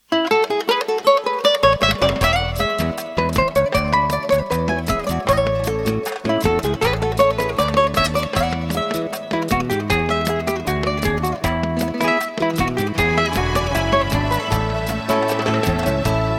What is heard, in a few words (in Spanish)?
Guitarra Vallenata, spanish guitar